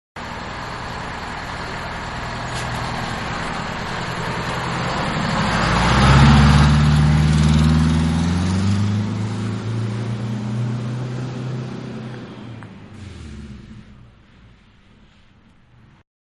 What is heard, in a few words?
Truck Diesel-Pull Out Of Garage; Trucks; Vehicles; Manhattan Production Music